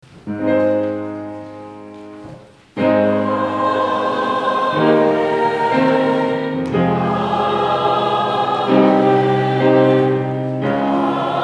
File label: Amen